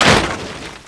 WOOD CRASH

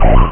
droid hit; DroidWars3

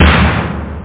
snare drum; snare samples; Mecomp Multimedia 1

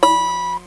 High E Note, Guitar; The Guitar Tutor; Educational

